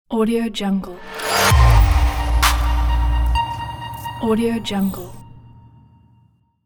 glitch logo tech

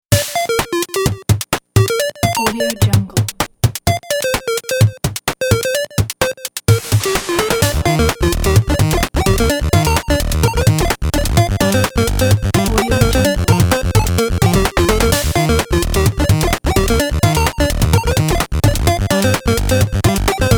8 bit game retro

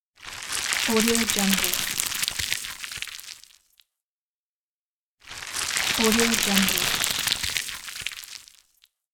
plant grow

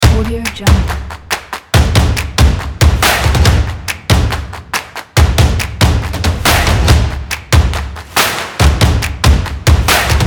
sport stomp and claps kit

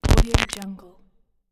glitch; bogdansfx

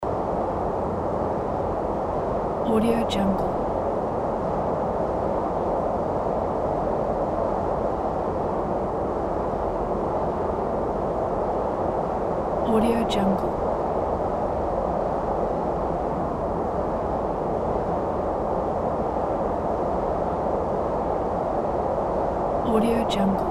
wind ambience

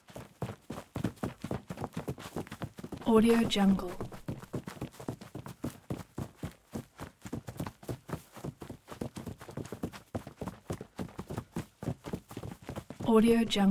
feet boots group sprint grass; soundmorph

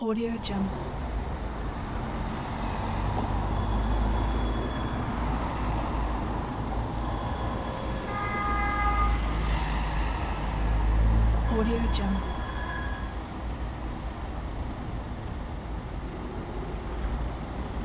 busy city traffic ambience with trams

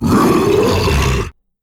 big monster roar

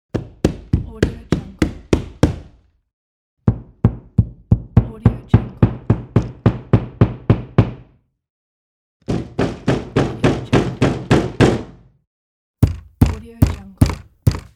banging on wall

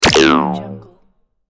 jump; kudlaproduction

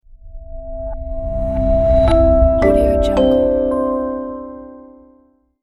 short clean logo; bluexmusic

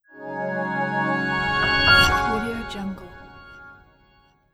modern bright logo reveal

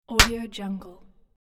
high five

